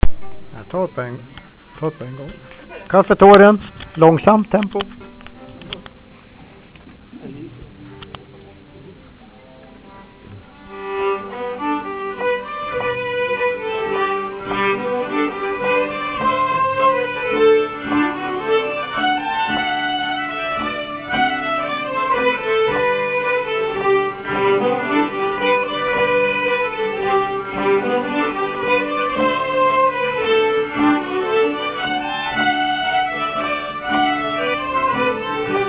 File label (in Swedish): langsamt tempo; Kaffetaren; Arkivinspelningar